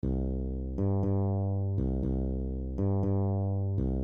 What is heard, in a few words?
Bossa Nova Groove Variations